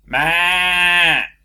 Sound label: sheep